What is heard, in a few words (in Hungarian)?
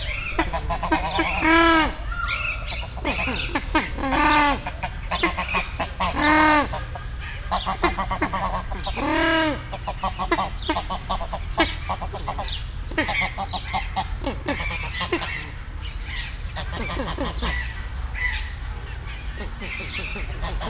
erős hang magellán lúd tojó a másik fodrostollú magyar lúd; debreceni zoo 2019